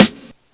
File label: SP12, Snares